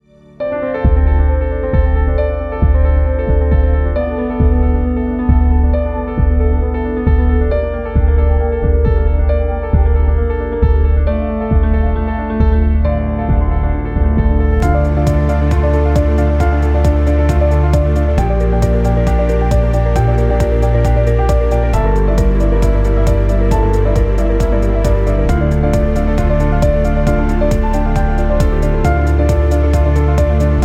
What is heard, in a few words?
piano